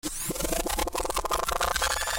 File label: rewind